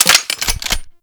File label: Double Barrel Shotgun Fire Mech; Warframe